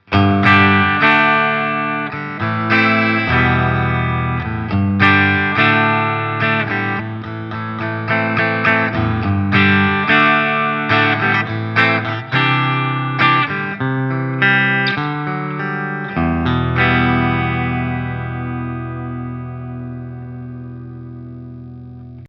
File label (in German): steg clean